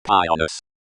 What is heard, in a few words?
pianus